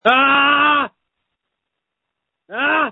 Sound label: screams